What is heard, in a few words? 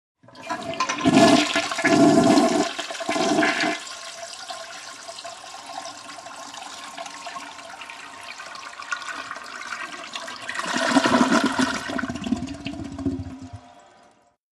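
Toilet Modern Low Tank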